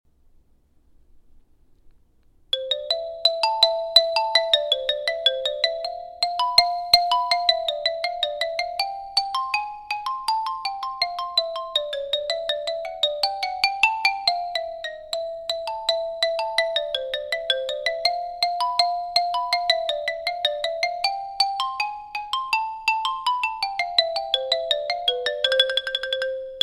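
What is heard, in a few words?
Hard mallet Xylophone; 8th grade all state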